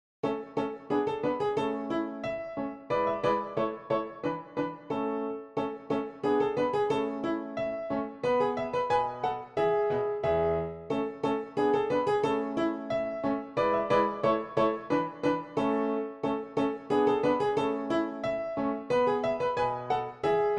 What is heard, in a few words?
Dance PianoTeq Bechstein; Dittersdorf 20 English Dances Schott